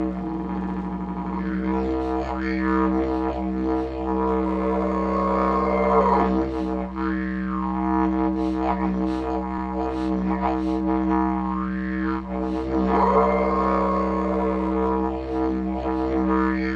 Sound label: Digiridoo